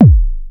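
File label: bass drum electronic compressed; 300 Drum Machines; Yamaha Motif ES-Series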